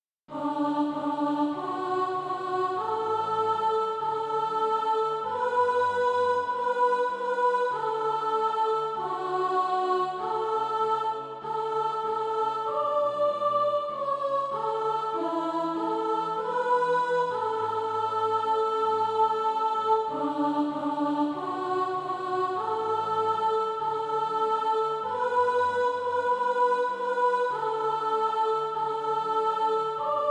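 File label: soprano, SATB Practise Music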